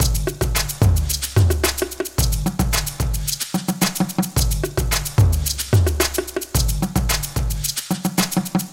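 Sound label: TOP LOOPS, Organic House / Deep House Sample Pack Oriental & Middle Eastern; Azura – Organic House Sample Pack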